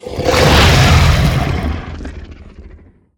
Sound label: Sniff, Behemoth; Evolve